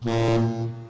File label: Ship Short Blast